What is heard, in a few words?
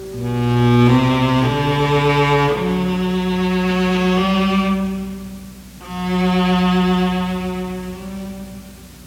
cello; strauss